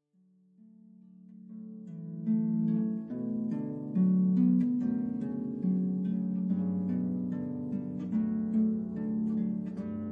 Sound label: Pachelbels Canon, harp; weddings events